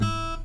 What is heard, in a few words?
ACSSFTFNGR, Guitar